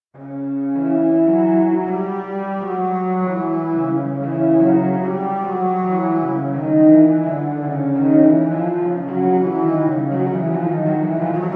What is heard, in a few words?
cello; darkness within 2 the dark lineage